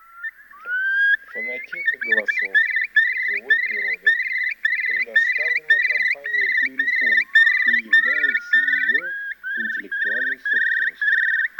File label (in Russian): Кроншнеп Chiurlo; plurifon